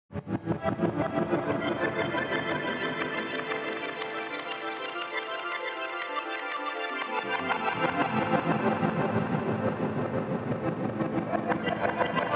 Swirling Flutters Voice Pairs Parallel Diatonic Full Legato Chord; Alchemy; Music Mouse